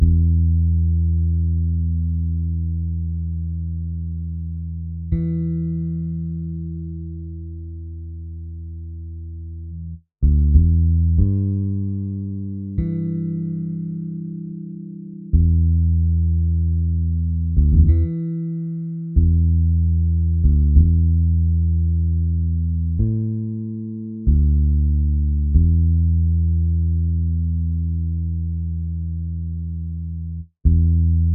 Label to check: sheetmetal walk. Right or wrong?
Wrong